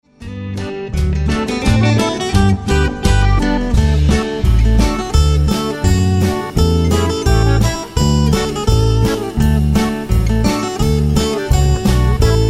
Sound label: Bluebell Polka